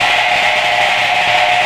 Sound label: URANOISE; Best Service Dream Experience